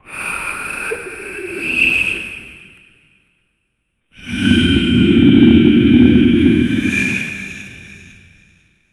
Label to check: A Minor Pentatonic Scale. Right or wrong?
Wrong